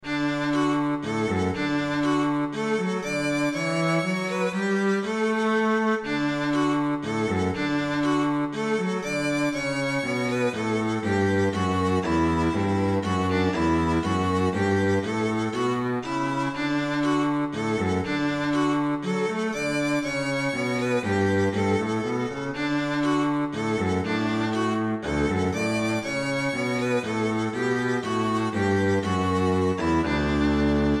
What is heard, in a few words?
waltz